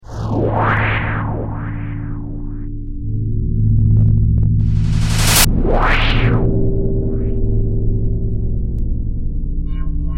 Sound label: bigben